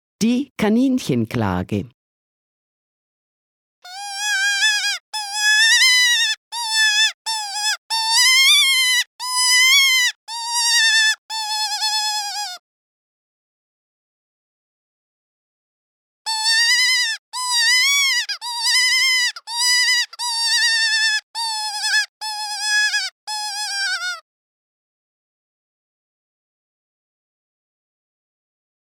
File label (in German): Die Kaninchenklage